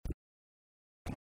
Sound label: beat